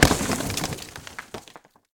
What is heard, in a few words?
break; BlockHead; World Of Goo